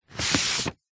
office file folder wallet card paper sheet document slide in or out